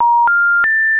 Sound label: Special Information Tone